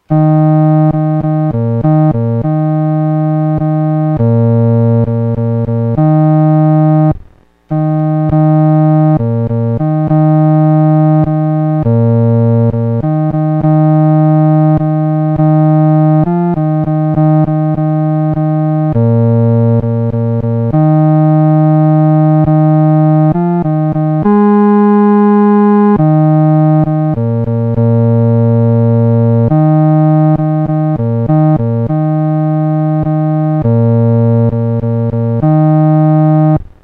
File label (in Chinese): 独奏 第四声; 主，我来就袮